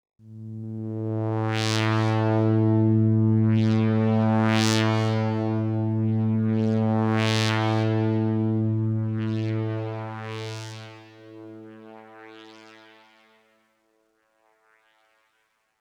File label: Oneshot Synth; Zombie Army 4 Dead War